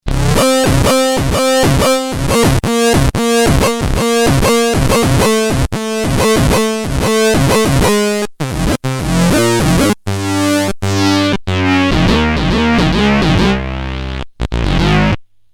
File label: HardSID Pulse Lead